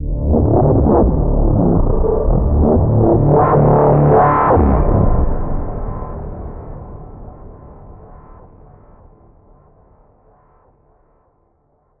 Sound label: vault warp, music; Half Life Alyx